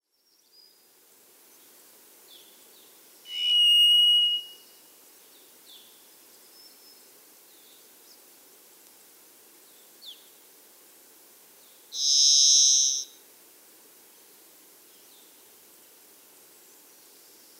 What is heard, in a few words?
Varied thrush